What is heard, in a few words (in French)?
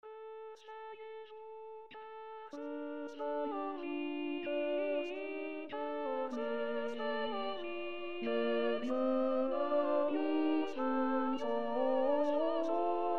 basse